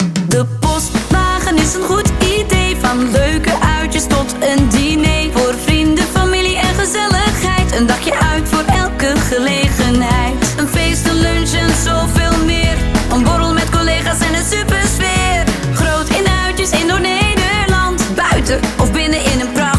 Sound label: Theme song; De Postwagen